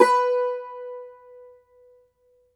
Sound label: Cumbus SAZ B, Plucked Strings; E-MU Producer Series Vol. 7 – Old World Instruments